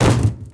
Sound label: bodyfall metal